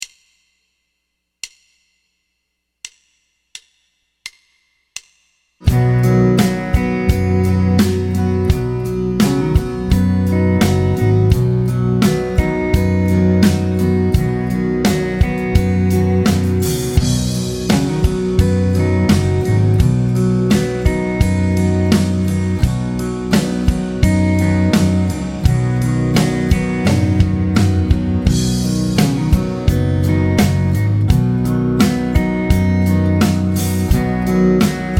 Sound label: Triad Vamp MAJ; Triad Vamp B Major; Piano Practice Tracks